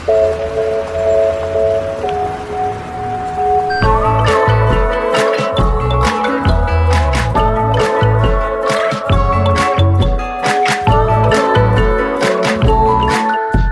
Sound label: digital ringtone huawei